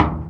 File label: Northline Transit Center Construction Dumpster, percussion; Houston